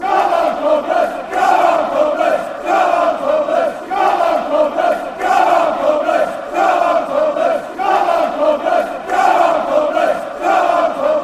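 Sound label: come cobblers, football chants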